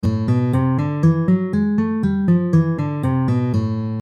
Melodicheskij Lya minor

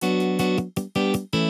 Ne zvuchashhie ili mertvye noty Mute

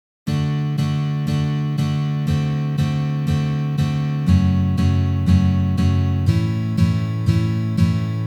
Uprazhnenie s akkordom D7

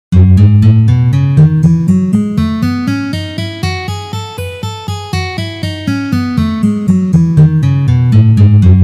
Gamma Mi mazhor Tri noty pozitsiya

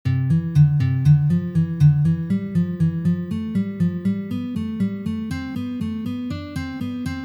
Uprazhnenie dlya igry gammy Do mazhor

